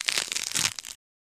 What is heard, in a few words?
Grapefruit Tear; gore stabs & eat